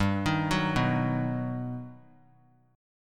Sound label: G Augmented Major 7th, chord; G+M7 arpeggiated; JGuitar